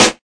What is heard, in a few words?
Snares